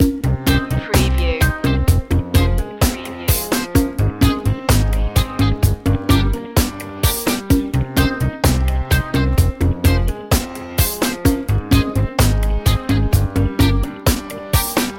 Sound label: reggae thing loop